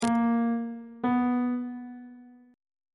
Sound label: c flat 8th intervals